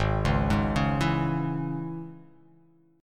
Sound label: G sharp Suspended 4th Sharp 5th G sharp; G#sus4#5; arpeggiated; JGuitar